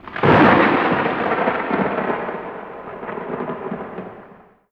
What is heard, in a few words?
A#3 THUND; RED VOLTAGE